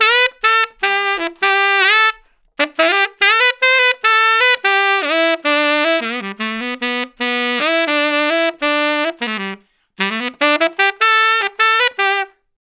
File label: Tenor Solo, Jazz, Traditional, Tenor Sax; Sonic Foundry (Sony Creative Software); Jazz Solos and Sections; Tenor Loops